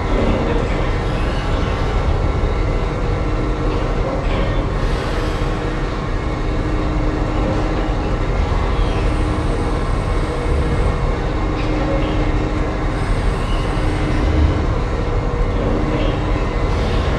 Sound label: mechanical rotator; Titanfall 2